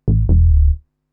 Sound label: SNTH BASS TEKNO 140 A